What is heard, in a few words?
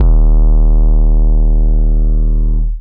808s